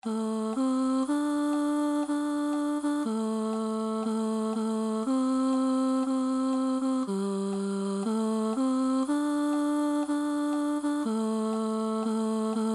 chorus Tenor

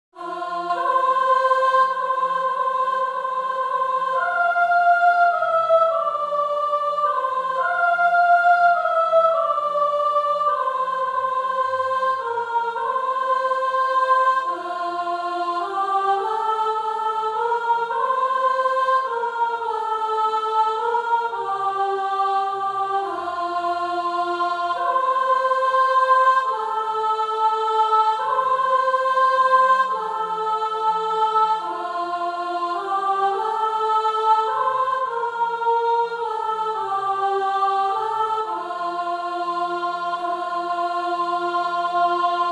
Sop Chorus

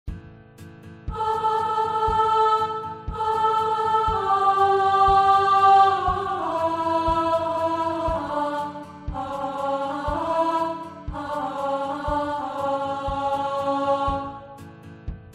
Chorus B Harmony

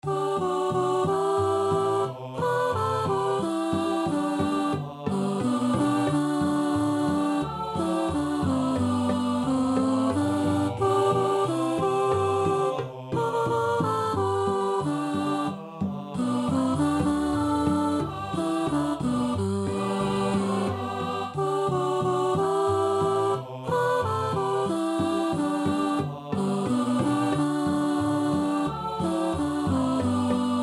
Murray River Chorus all

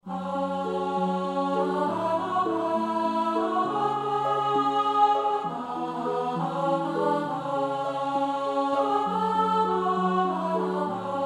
Ned Kelly's Smiling Eyes Alto

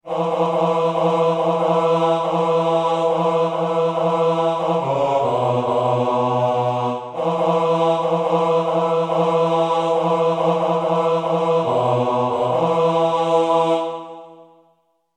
Chorus Bass

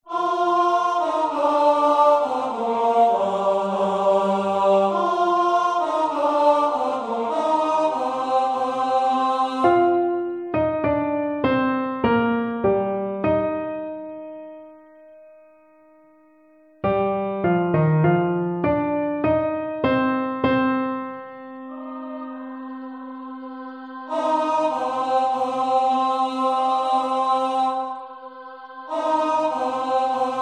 Were I laid on Greenland's coast Tenor

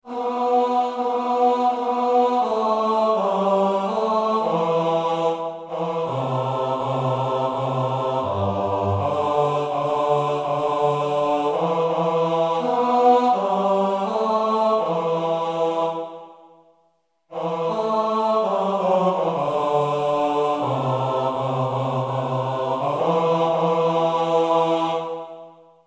Bass

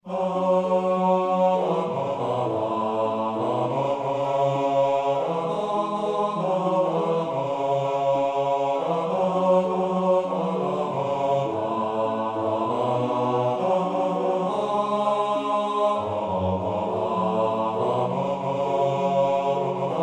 Men

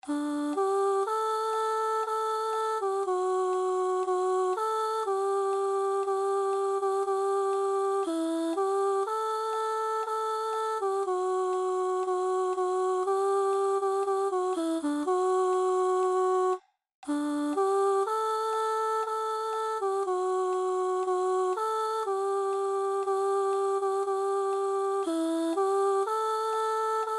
chorus Soprano

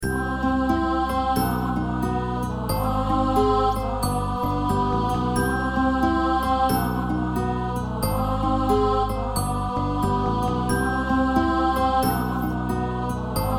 Alto

